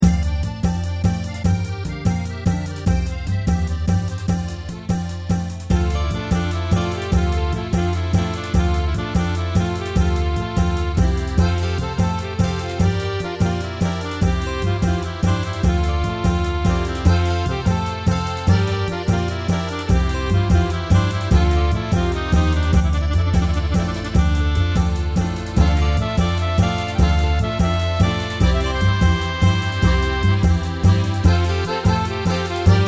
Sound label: Oboe